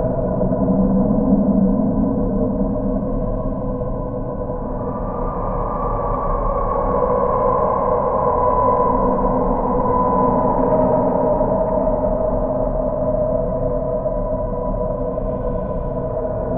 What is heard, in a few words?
juggernaut avian idle; Stellaris; federations